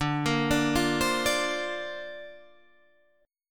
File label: D-Minor 7th Sharp 5th D, chord